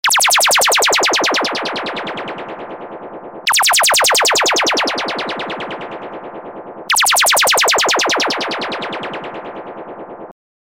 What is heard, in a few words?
Space Alert